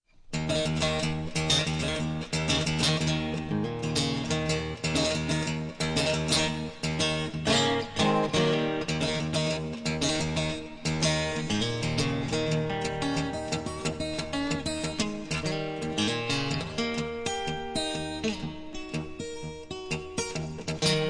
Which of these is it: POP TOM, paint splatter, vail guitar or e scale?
vail guitar